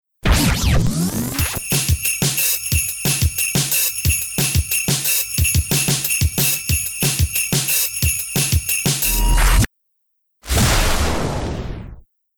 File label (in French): Base rythmé